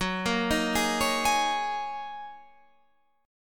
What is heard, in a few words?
F sharp 6th Add 9th F sharp; F#6add9; 14,13,13,13,14,16; arpeggiated